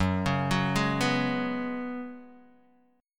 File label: Gb Suspended 2nd Suspended 4th, Guitar Open D